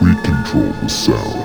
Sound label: Control Vox - 8A; Jungle; Vocals; Blu Mar Ten - Jungle Jungle - 1989 to 1999 Samplepack